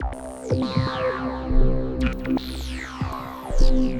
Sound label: Orbit Synth; Sonic Foundry; The Electronic Music Manuscript Collection; Synth SFX